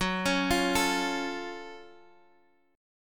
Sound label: Gb Minor Major 7th, Guitar, DADGAD; down; chord shape